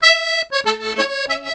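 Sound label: piano accordion toot; Musical Instruments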